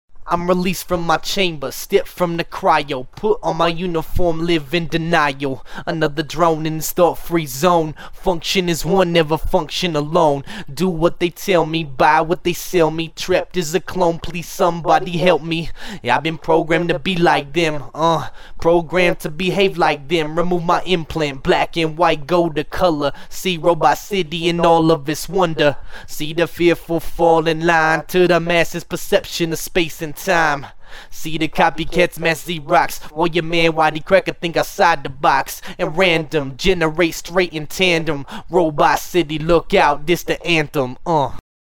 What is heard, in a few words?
acapella